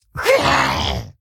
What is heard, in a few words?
Male Kobold Death